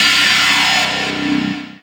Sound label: D+B; Best Service; XXL Production Effects; DANCE; Sample CDs